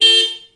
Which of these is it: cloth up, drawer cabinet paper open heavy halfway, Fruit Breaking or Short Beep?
Short Beep